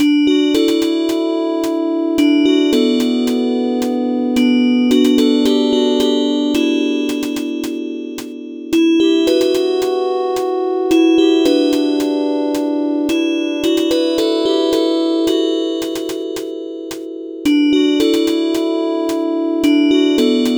dreamland lullaby, lullaby type song for a dream level with a marching hihat rhythm, loops